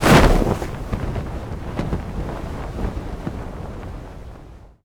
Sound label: parachute open